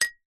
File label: household teaspoon single tap on side of cup ceramic mug